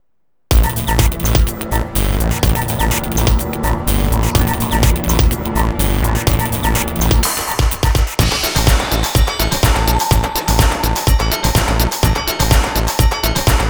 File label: Boss Battle; Vampire Rain Altered Species; PlayStation 3